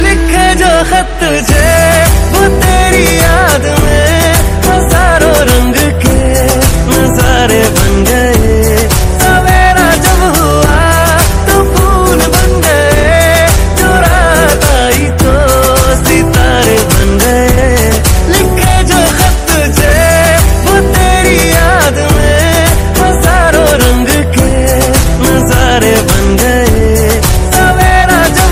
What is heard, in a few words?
hindi ringtone, bollywood ringtones